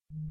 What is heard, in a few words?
global rollover; Cars